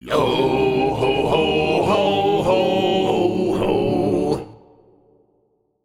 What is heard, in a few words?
skulls singing